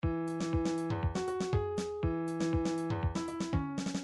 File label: funk, midi